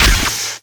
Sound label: gun Metal, Damage